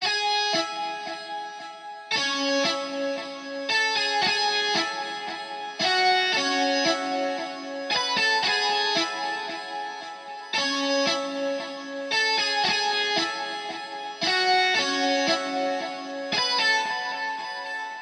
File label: 114 Guitar